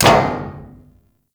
Ship Hit; RailJack Hit Effects

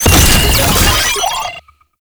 Sniper Drone Deploy; Warframe; Lasrian; Drones

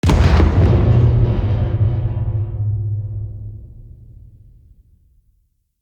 Ship Damage Explosion; Vehicles; RailJack; RailJack Hit Effects

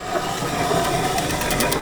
Piston Raise; Warframe; Eidolon Plains

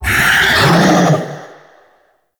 Reaper Vocal Attack; Warframe; Wraith

